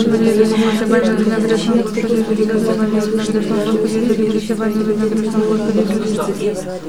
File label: NOISE, CLASSIC VOX; Best Service; Hallelujah